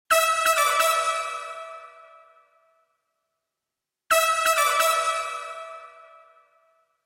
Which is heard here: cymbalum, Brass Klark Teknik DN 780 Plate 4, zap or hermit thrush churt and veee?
Brass Klark Teknik DN 780 Plate 4